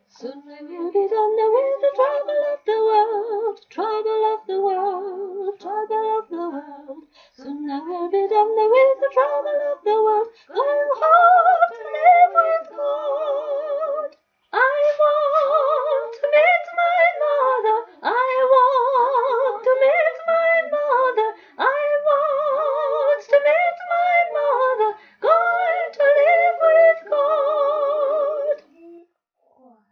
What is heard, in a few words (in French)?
Soprane; Soon I will be done